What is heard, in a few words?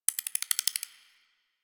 foliage insect; Warframe; Duviri